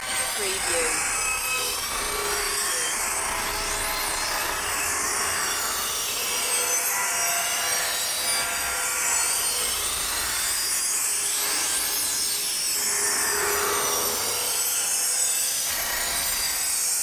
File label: electrostatic chaos ambience loop